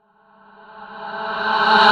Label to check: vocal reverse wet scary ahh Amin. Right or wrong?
Right